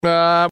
bluuuaaaah; seacrest

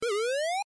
jumpy desk

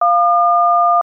dtmf dial tones

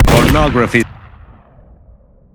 revolver sound; spy; tf2

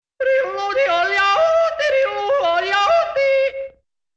yodel; ed edd n eddy

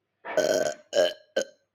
burp

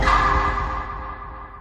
skill check; dbd

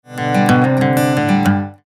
guitar sample

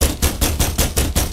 loud knocking

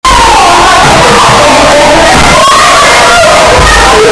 clang; myszka

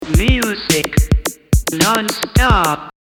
music non stop

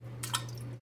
poop plop

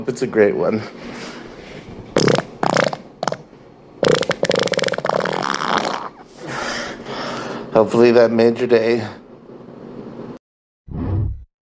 fart bomb

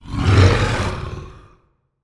alien grunt

